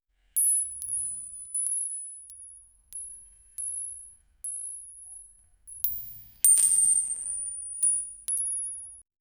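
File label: bullets flies thru concrete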